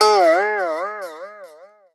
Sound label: tremolo